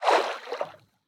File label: Floor Sink; Boomerang Fu